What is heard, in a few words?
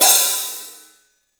CYFX, EFFECTCYMB; 3D Sound; 3D Drums